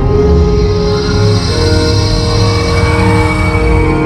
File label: Transporter; trek